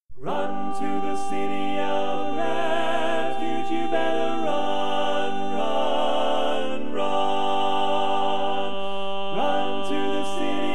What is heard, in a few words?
Bass, Classic Tags